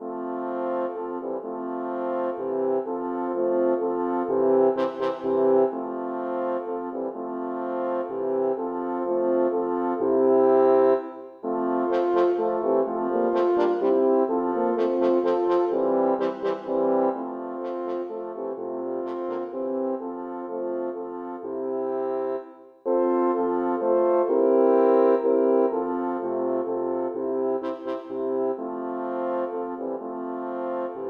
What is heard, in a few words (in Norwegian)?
For fire horn